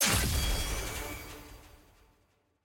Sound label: purchase click; samira